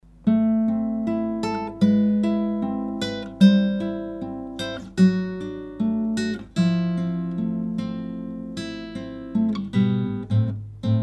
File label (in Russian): Audio-Technica ATR-1200 gitara nejlon, гитара (нейлон); Звучание Audio-Technica ATR-1200 — гитара (нейлон); динамических кардиоидных микрофонона; Рассмотрим 4 бюджетных динамических кардиоидных микрофонона от Audio-Technica, линейки ATR